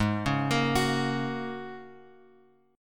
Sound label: Ab 9th Suspended 4th Ab down Bass Standard; Ab9sus4 arpeggiated; JGuitar